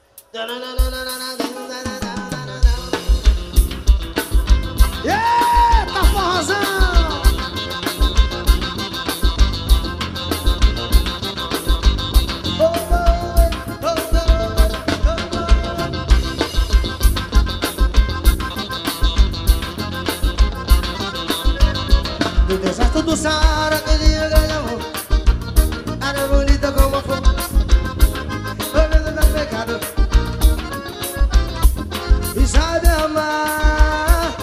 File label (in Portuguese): forro, danca do ventre